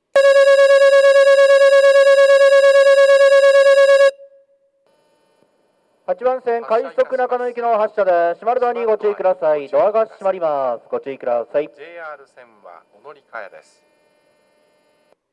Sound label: nishi funabashi, station